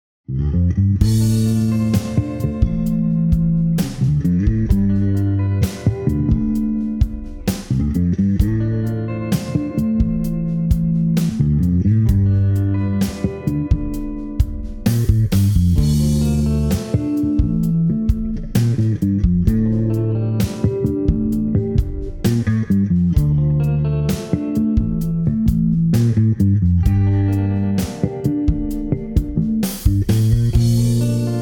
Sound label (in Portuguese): instrumental